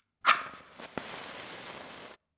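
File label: match sound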